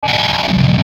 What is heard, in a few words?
Chopper Distortion Ascend Descend; Blastwave; Chopper Sound Effects